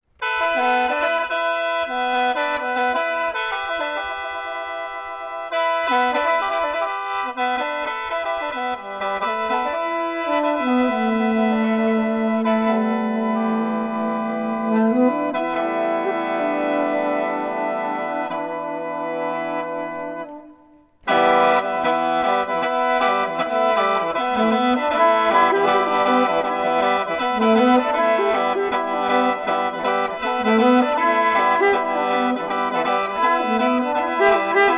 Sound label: sax